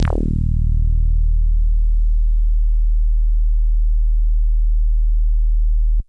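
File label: Synthe Bass DX100 D#2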